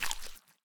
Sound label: step; minecraft; block